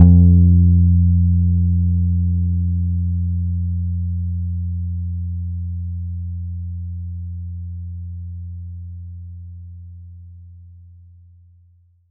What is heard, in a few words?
Electric Bass, F2; Polyend; Simulacrum Sounds; 1 Shots; Acoustic and Electric